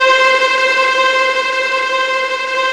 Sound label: TREMOLO C5, TREM STRINGS; Greytsounds VOL-1